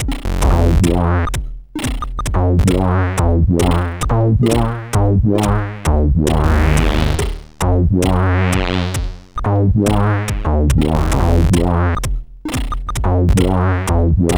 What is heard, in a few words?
Intro, CSG8580R5; Pocket Money